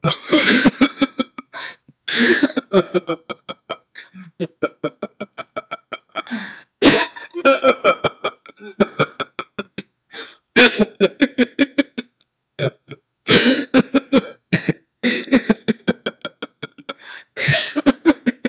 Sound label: sound effect of a man, giggling